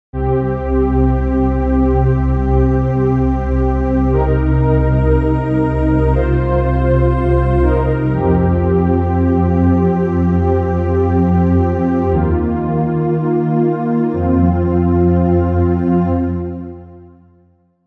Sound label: Embracer Basic Element